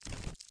drone movement; svencoop; aliens drone

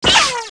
sabre block

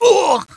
pain, male

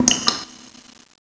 drips

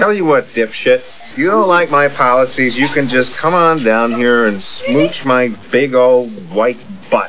smooch; svencoop

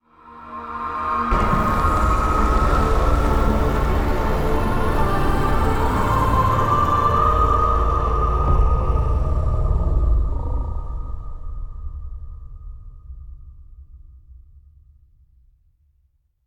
round end

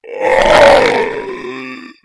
zombie pain

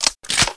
awp clip in, weapons; svencoop

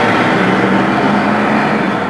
car level sounds; svencoop; amckern

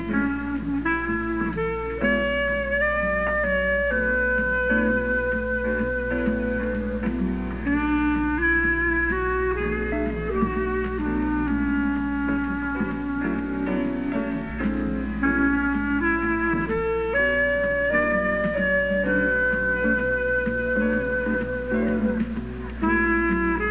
jazz; drumdude; old

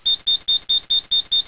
c4 beep; tfc

